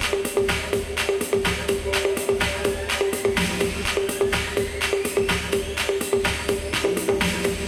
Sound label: freespin music loop; spinsane